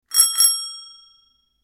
bicycle bell ring

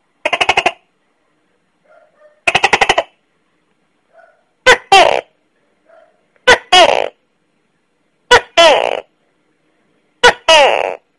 tacke tacke, gecko sound effect

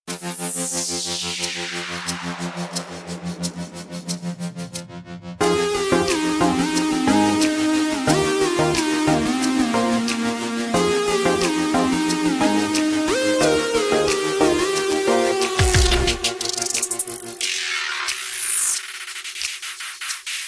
cabaret edm

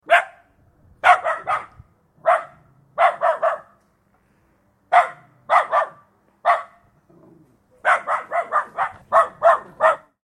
dog barks

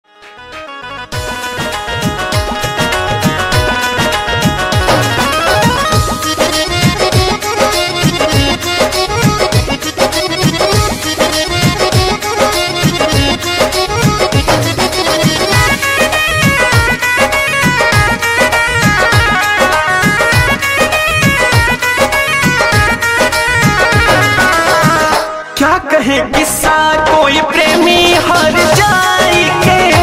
Qawwali

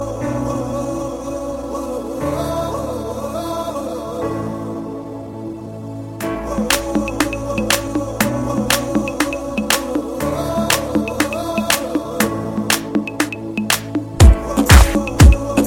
remix ringtone

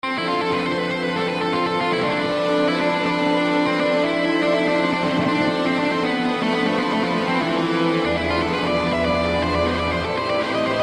metal sms

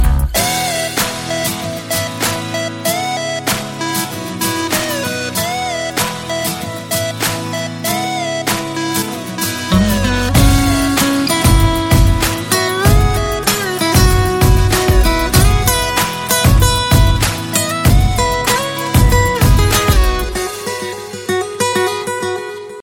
new musical ringtone

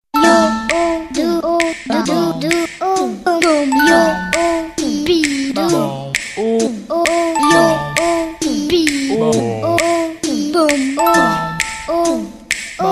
for cats relaxing music

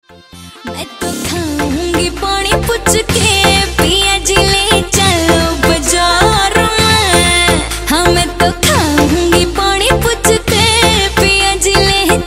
Haryanvi DJ Mashup Full Dj Song; New Haryanvi

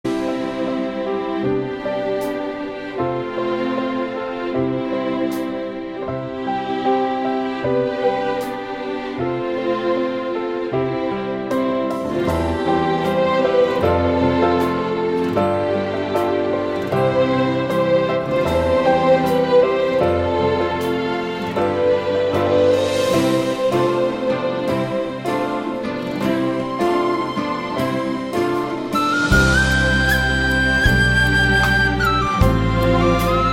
instrumental

